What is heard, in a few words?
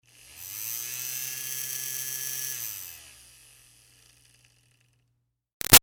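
Fan Small Handheld Medium Far Run Short; Electronics; BigRoomSound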